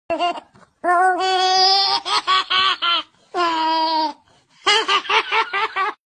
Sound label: child's evil laugh